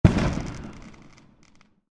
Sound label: Firework Explode: Exterior, Spark At End; Slight Spark At End; Fourth of July; Stuart Duffield